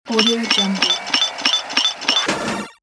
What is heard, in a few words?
slot machine spin